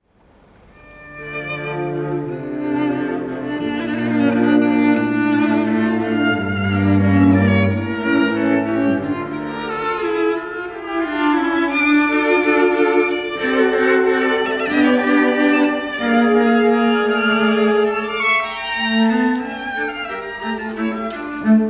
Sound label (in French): Mozart Quatuor; Sine Qua Non